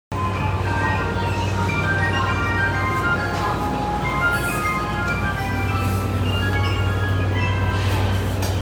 JR 山手線 浜松町 CW, train sounds

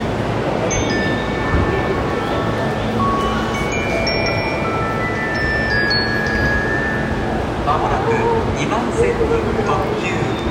線調布西接近, train sounds; 京王

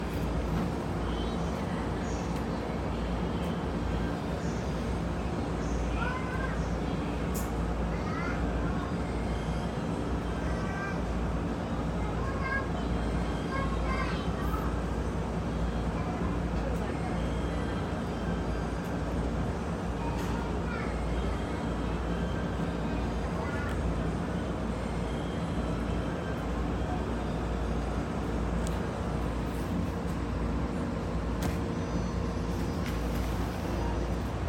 JR東海道線戸塚南, train sounds

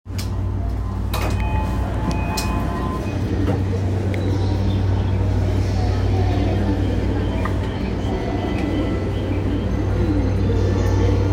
JR 山手線 高輪ゲートウェイ CCW, train sounds

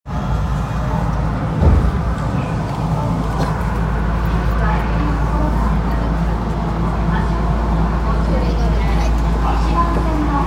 銀座線京橋西, train sounds

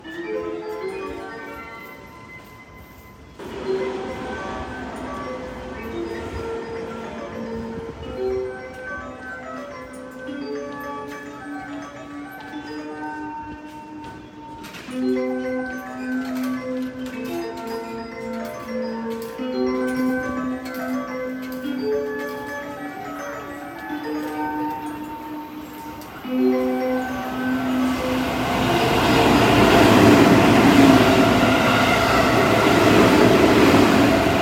JR阪和線三国ヶ丘北通過

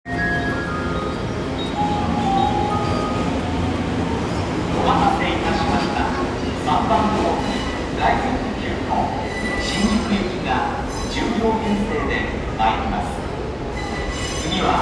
接近, train sounds; 小田急線海老名東

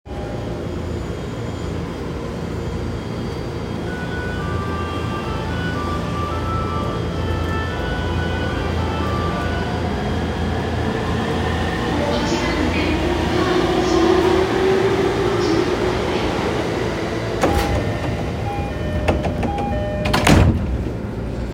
JR東海道線二宮南, train sounds

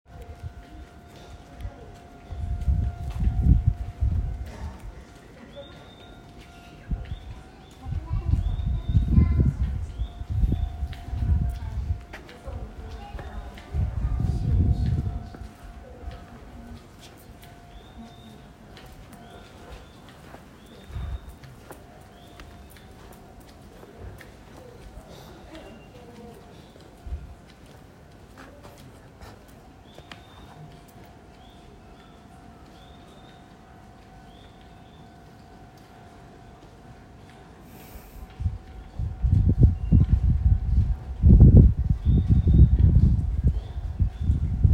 京王線柴崎西通過

